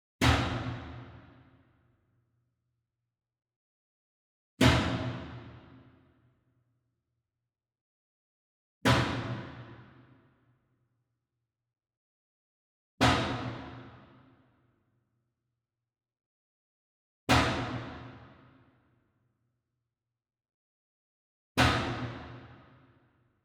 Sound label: Metal Bin Soft Hit Far; SWEETENER; boom library; Cinematic Strikes Construction Kit